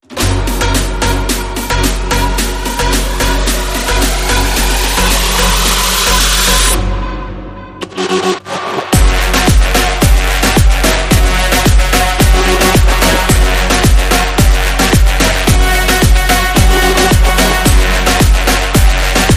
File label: Moombah Trap